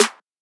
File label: trap acoustic snare g sharp key